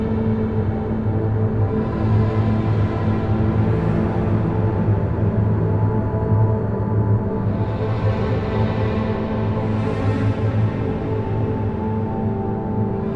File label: Suspense Loop; Toren